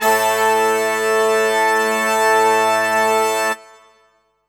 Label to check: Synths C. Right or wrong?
Right